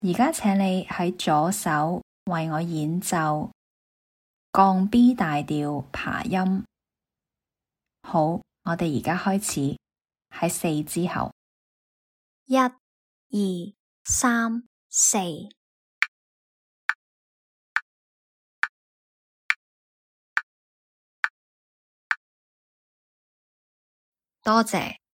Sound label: b flat maj arp lh